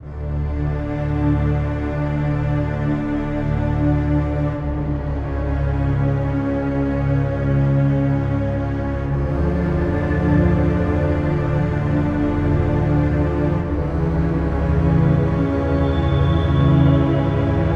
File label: Ambiance Suspense; edge of eternity; EOE Ambiance Suspense V2